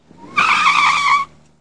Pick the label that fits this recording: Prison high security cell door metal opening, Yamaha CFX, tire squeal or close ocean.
tire squeal